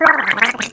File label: drown; quake2; karrot